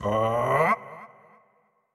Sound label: WindUp Long; Marv